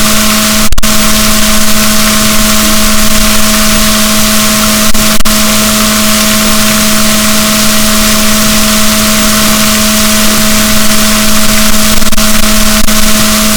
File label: rorquals negatif, boat